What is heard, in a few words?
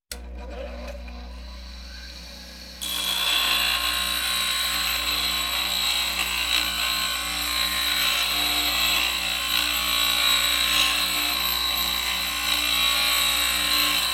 Walking Machinery Trucks